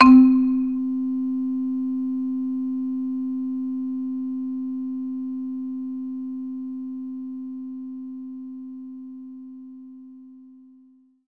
Marimba C4; Knights Try

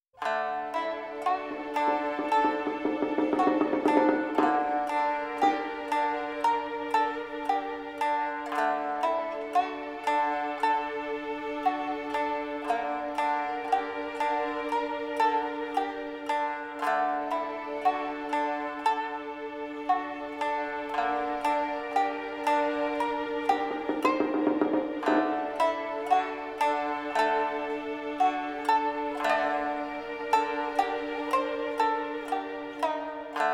Brave Hunters - Instrumental; Monster Hunter Rise original soundtrack; Minoto

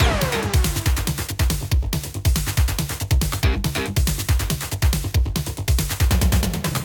Alert Mode Loop

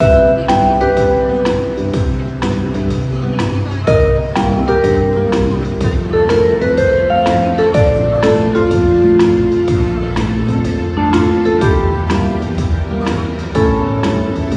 Cafe Ambient BGM; Pronto

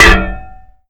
Lamp Post; Nicktoons Nitro; arcade

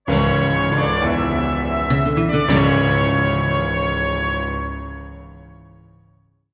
Mexico Failed

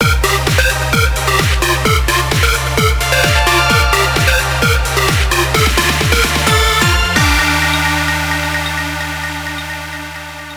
Goal Jingle

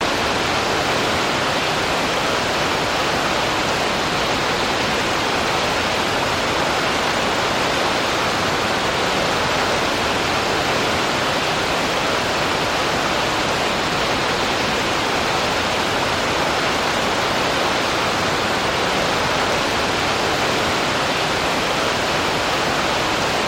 ambient waterfall far

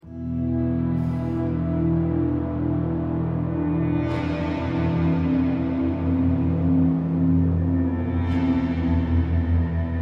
Climax Stinger; Dreissk 02; State of Decay

